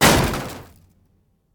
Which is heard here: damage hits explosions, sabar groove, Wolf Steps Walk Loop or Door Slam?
Door Slam